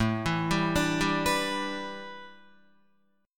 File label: A 7th Suspended 2nd Suspended 4th Guitar; 4,7,7,7,7,7; A7sus2sus4 arpeggiated